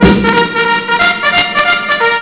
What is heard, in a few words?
trara